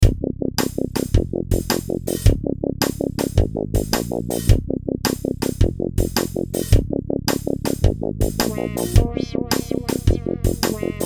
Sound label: ELKA solist 505